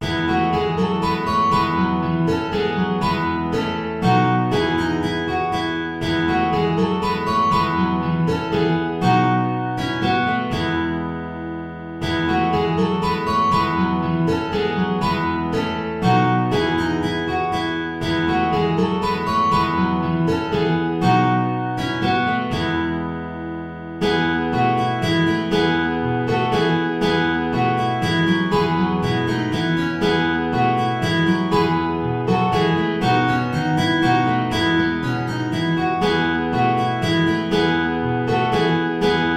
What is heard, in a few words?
saltarello; praetorius michael